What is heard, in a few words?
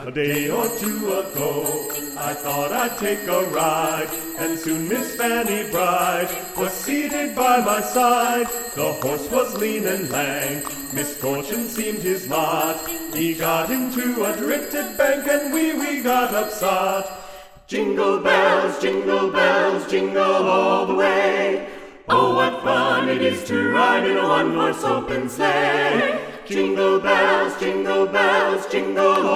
Jingle Bells, Carolers